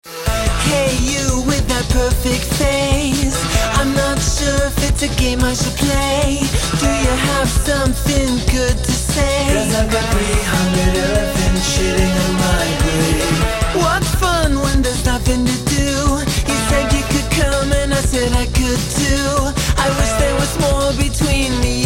the drums

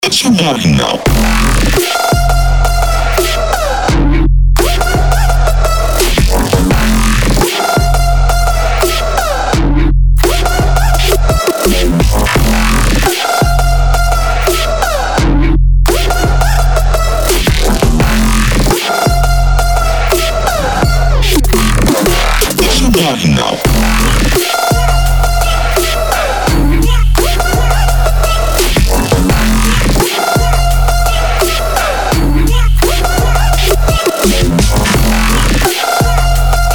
trap

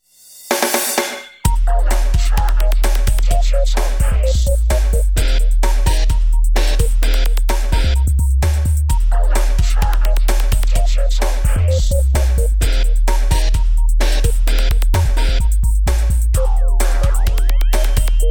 automated digital bass

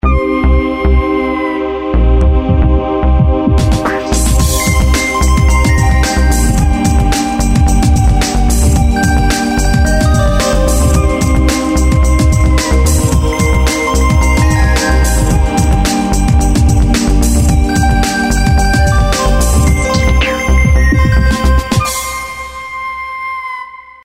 nokia tone vertu

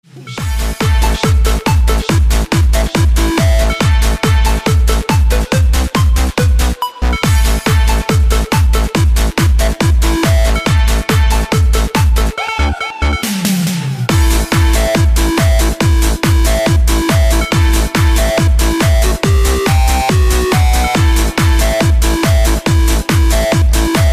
ragga

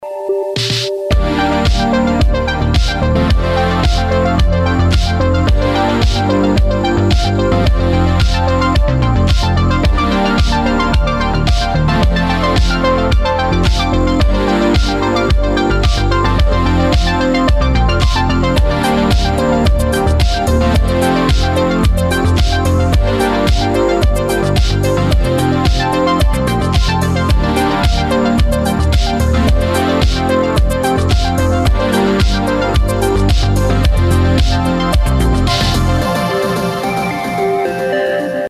vaporwave nu disco future funk synthwave

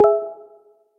samsung hangout message

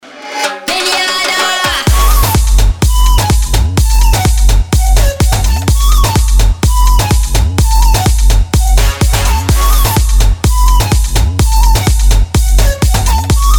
tribal moombahton

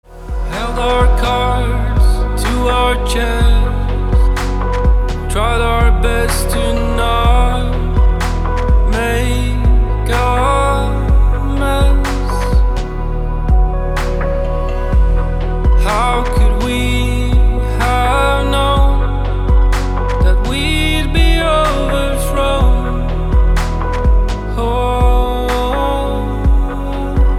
chillout vocal mix

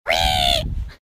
hruk angry birds

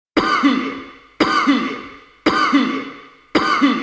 disco

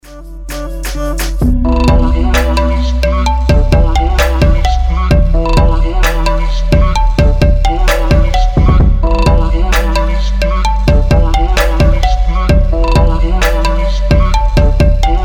chill trap

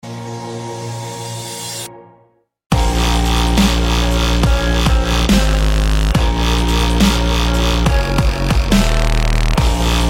theme dubstep remix; 28 Days Later

